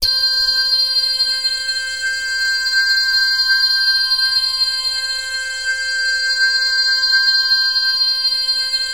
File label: Access Virus B; Synthworx